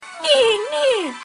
Neigh Neigh

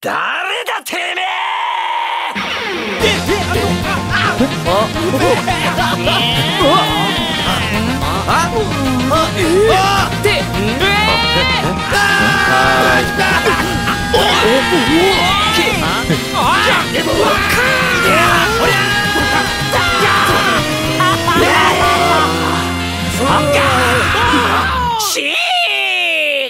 Yell Tone

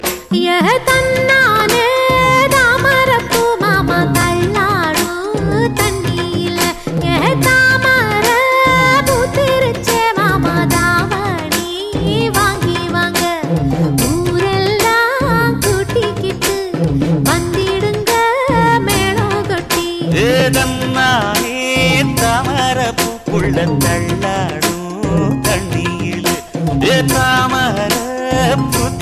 Tamil Gana Music

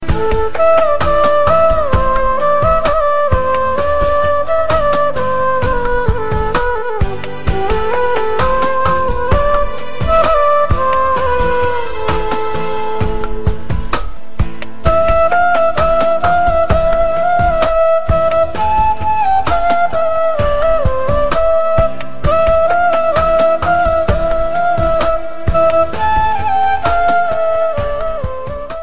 Flute Low

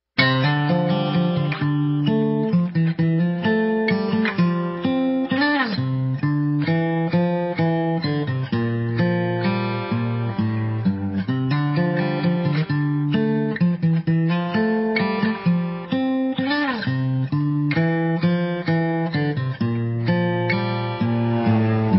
Emo Guitar

